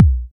Kicks Electro

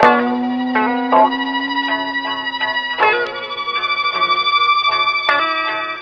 guitar high violin tremble, loops; Phonky

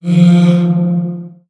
breath, Voice; Notes

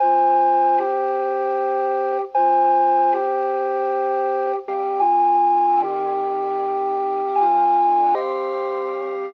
flute

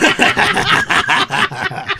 laughter group

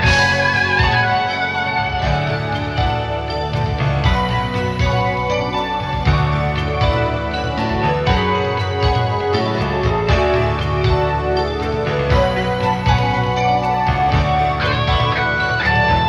keys and guitar; Phonky

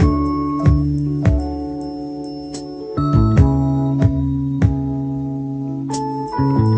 keys bright, jazz loops

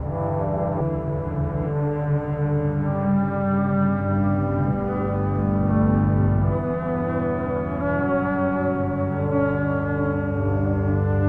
strings, Sad

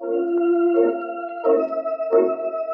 keys

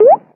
Pop, Misc Percussion

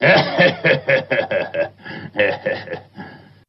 laugh ninja; ooh ahh

